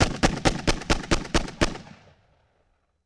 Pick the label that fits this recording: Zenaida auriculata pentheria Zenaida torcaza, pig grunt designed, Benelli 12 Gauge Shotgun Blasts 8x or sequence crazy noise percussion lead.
Benelli 12 Gauge Shotgun Blasts 8x